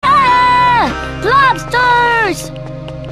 ahhh; lobsters

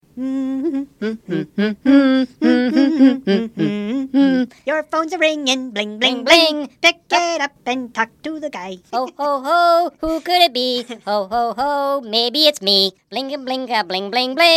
Hohoho

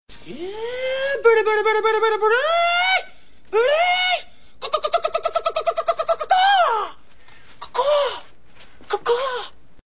Caw Caw

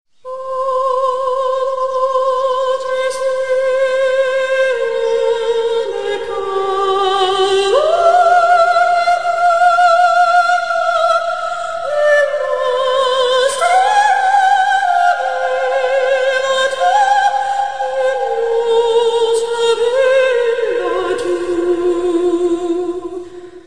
Diva A Cappella

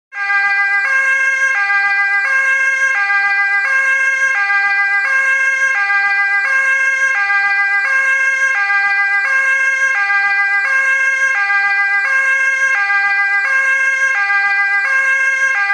Ambulance siren; NL

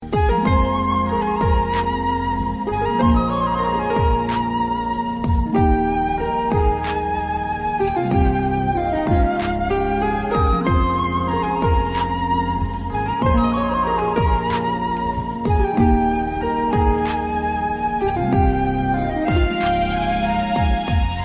Harp Flute

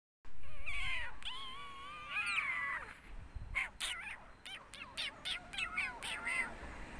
Cat remix

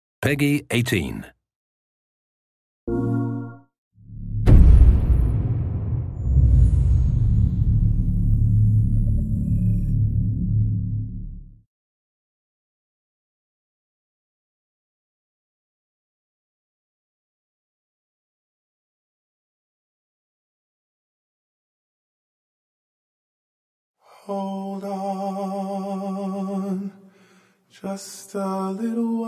Singing

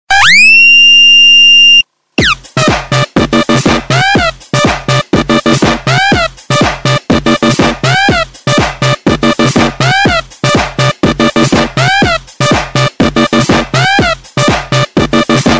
funky

